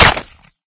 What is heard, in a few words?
headshot; player; Source Engine; cstrike